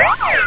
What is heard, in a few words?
wheeeyooo!; charley cat catch a canary